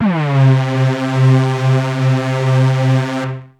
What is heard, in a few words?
Techno leads, hits and riffs